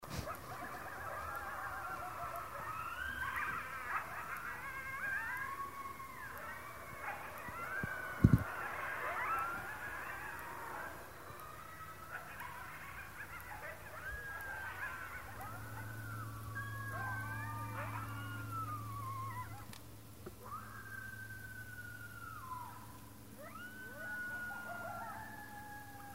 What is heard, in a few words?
Coyotes Howling